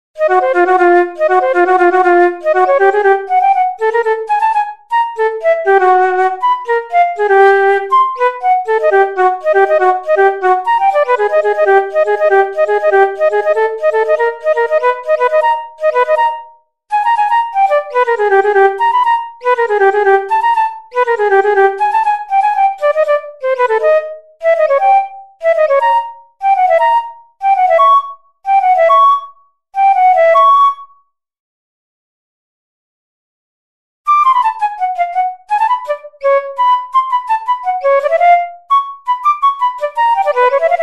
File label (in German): Floeten Solo